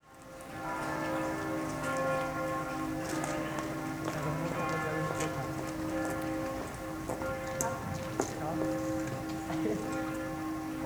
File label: Cembra